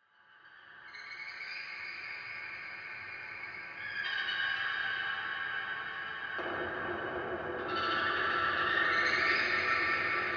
Horror Metal Squeaks In Basement Heavy Reverb; Scary Soundscape Sound Effects; SFX Bible 6

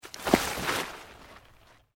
PLANT LEAVES LARGE MOVE FAST, rustling

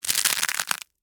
Texture, Crunch, Cereal, Honey, Oats; Crunches (Sound Effects:Objects); BlastWave

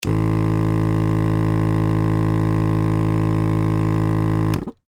Suction Unit On Run Off Ambulance Interior; Emergency; Emergency Vehicle

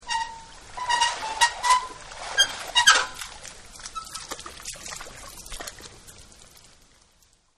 Out Of Pool; Pools; Partners In Rhyme

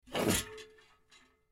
Paint Can Empty Pick Up; Paint Can Empty Pick Up Wood; Pick Ups; Blastwave

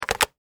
Telephone Cordless Receiver Hang Up; Technology; Telephones

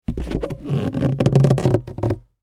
Foley Balloon Rub; Balloons; Cartoons

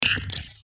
Hit Transition Electrical Device; Blastwave

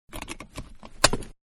Mid Size Car Seatbelt Close; Vehicles; Car Sound Effects

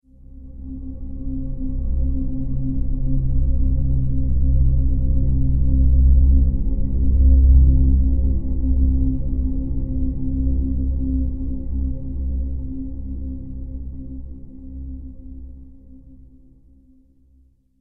Horror Movie Atmospheric Transition; Scary Soundscape Sound Effects

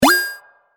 Digital Signal Wapling; Digital Clicks, Blips & Alerts

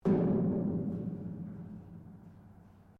METAL IMPACT HOLLOW; Materials; Foundation

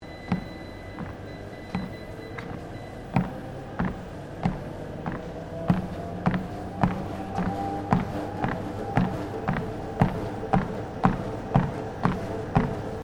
Gym Treadmill Startup; Various gym machines; Sports; Equipment

